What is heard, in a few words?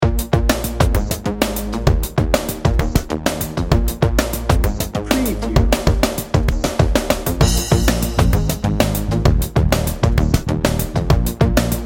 soul electro dnb